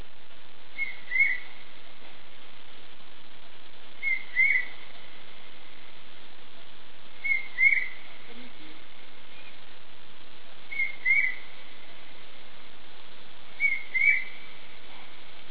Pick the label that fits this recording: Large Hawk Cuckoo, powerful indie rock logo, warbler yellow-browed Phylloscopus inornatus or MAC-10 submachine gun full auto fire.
Large Hawk Cuckoo